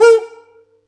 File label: Yamaha EX5, Drums